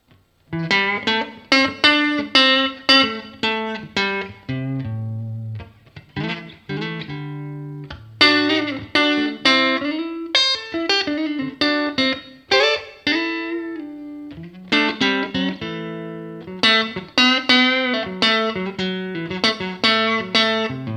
UAD OX Amp Top Box

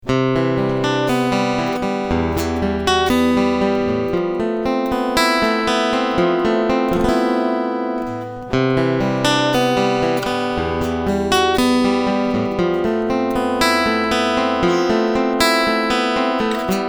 Picking Preamp

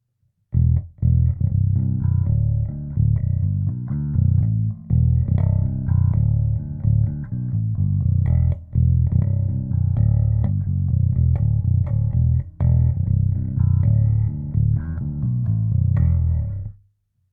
bassboost Mikro nahe

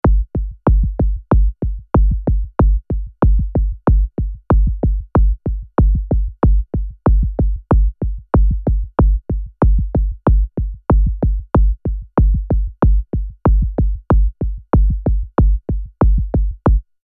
Moog DFAM Slight Shuffle Kicks

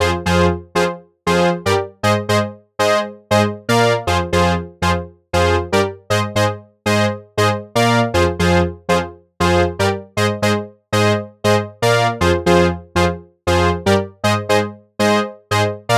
Anthemic Brass dry; ADC 118

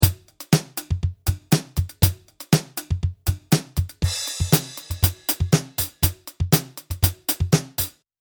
Drums

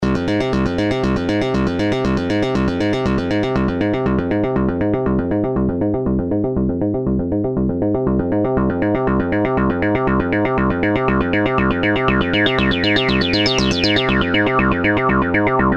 filter

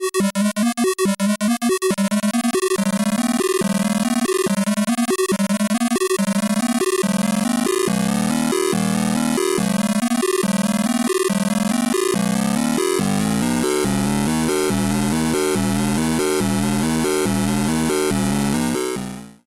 Erica Synths Pico System 3 Shape CV FM Mod

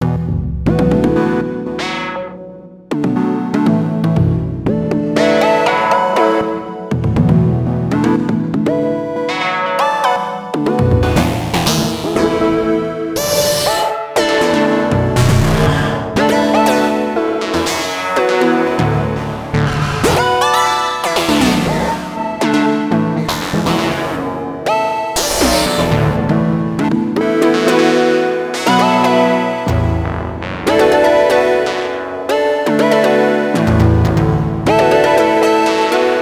Filter Growth Env; Soniccharge Synplant 2 Isasound

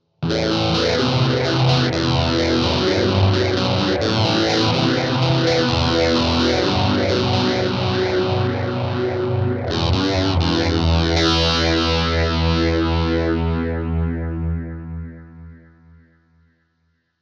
VALETON Dapper Indie fuzz phaser tape hall

